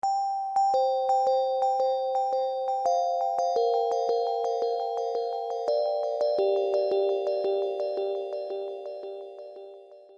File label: chimes, alarms